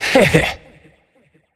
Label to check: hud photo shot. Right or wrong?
Wrong